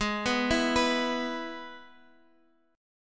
G sharp Minor Sharp 5th; 13,11,12,14

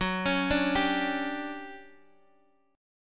D Major 11th Gb, chord

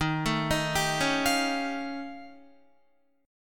Eb 9th Flat 5th, Guitar; Eb9b5; arpeggiated; 11,12,13,12,13,12; JGuitar

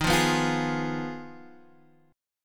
F Minor 7th Flat 5th Eb, guitar chord

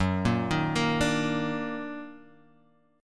D sharp Minor Major 7th F sharp, chord

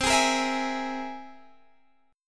C Augmented 7th; strummed; x,15,18,15,17,16

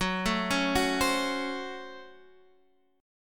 Db Major 7th Suspended 4th Sharp 5th Gb

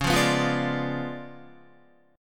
Db 7th Flat 9th, Guitar; Db7b9 strummed; JGuitar

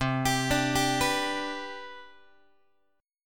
C Major 7th Suspended 2nd C down Guitar, chord; CM7sus2 arpeggiated; JGuitar

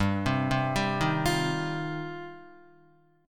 G 7th Suspended 4th Sharp 5th, Guitar

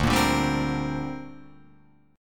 D 7th Suspended 4th Sharp 5th down Guitar Open F; D7sus4#5; strummed

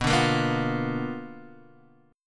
B Minor Major 7th Flat 5th, chord; strummed; JGuitar

